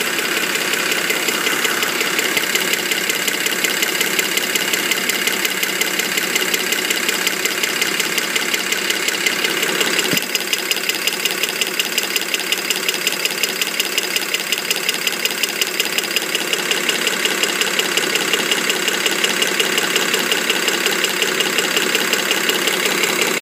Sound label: ticking sound engine bmw; e61 touring